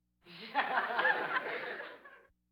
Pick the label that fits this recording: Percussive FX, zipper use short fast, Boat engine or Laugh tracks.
Laugh tracks